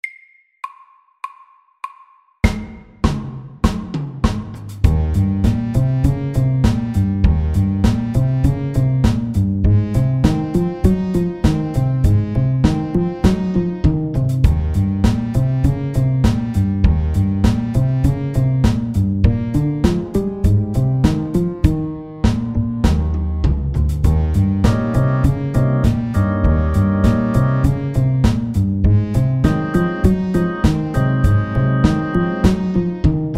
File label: walking bass fast 100